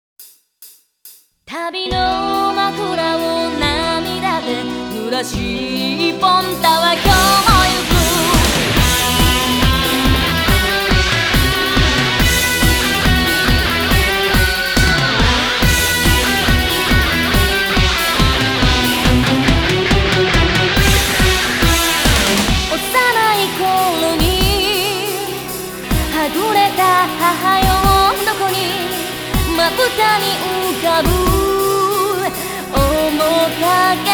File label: Bass Minus Track